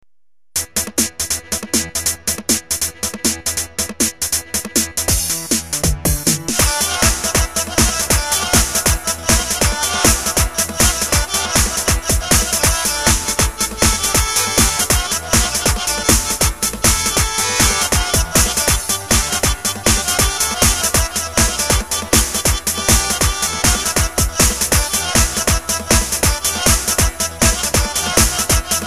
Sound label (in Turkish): 7 8 tekno, roland gw 8 karadeniz